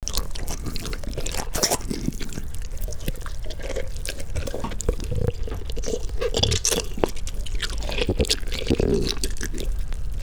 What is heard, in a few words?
Unimaginably Uncomfortable Gooshy Sounds, melon; Micro Pack